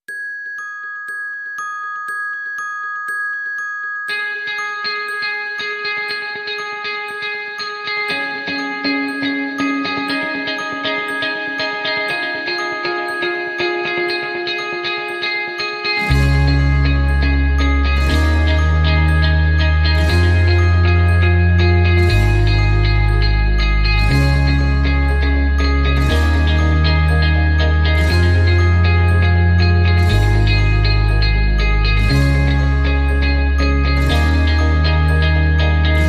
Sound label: No Drums No Melody